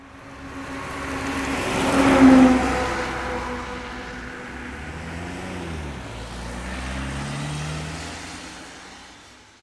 car drives wet; best service Blue Box

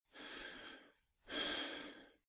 Breathe Half breathless; Eden; RadwinIsland

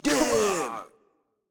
Crowd Cheer; Pitch Black Audio Pong

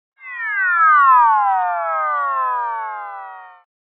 Comic Slide Long Going Down; Hollywood Edge; sound designer's toolkit v2

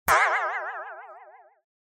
Impact Comic Pulsating Metal Hits Medium; Hollywood Edge; sound designer's toolkit v2

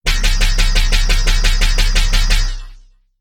blast release; Apotheorasis

